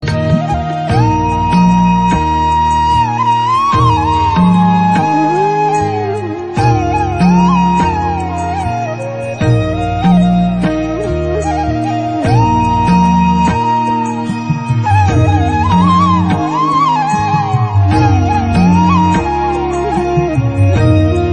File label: Flute Electronica